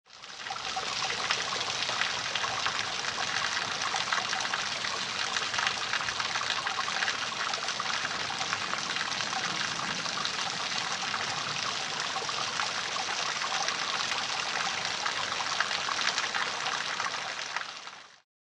Water Pouring Out An Outdoor Drainage, Drainage Pipe; Water Pouring Out An Outdoor Drainage Pipe Onto Concrete